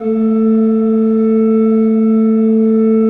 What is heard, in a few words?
A2, Cathedral Organ, ROHRFLOETE; Propeller Island